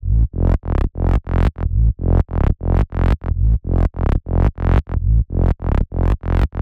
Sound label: bass